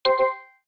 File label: Video Stop, ui